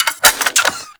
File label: Microwave Pistol Reload; Warframe; Grineer